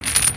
chain drag; creatures organic

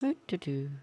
bored doot doo, emotes

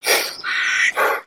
Pet Dragon Happy; creatures; pets; Lego

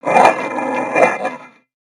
Pet Dog Robotic Angry; creatures pets; Lego

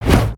Swing; Mole Whacker

